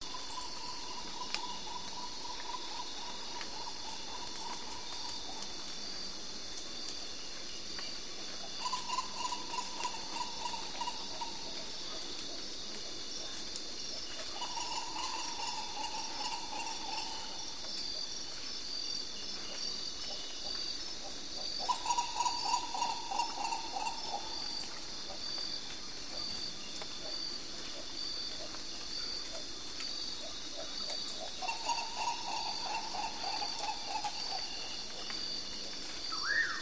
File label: Peru Jungle; audio hero